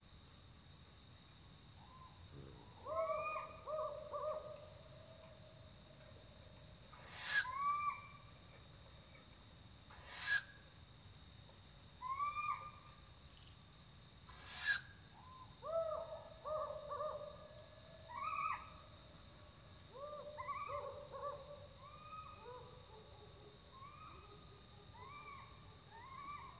Humes Owl Male Female Nestling Encounter With Various Calls Including Pulsed Hooting